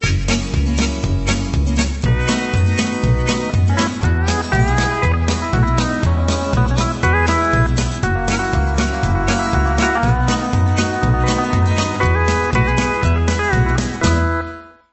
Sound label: harp jam; Nostalgic